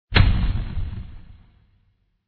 fire attack contact bottom; krofdor

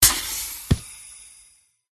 snake death; pet

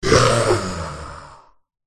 skeleton attack voice middle

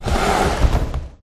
WhiteBear Die

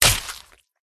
tiger attack contact bottom

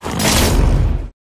Rat

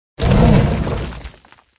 golem attack voice middle

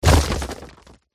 golem attack contact

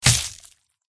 mini dragon attack contact middle

